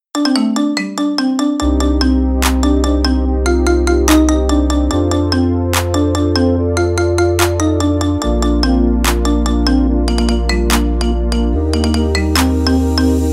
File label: iphone marimba remix